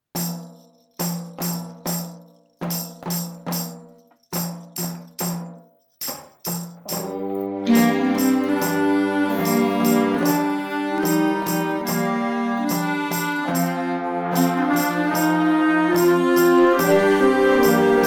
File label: advanced band remote performance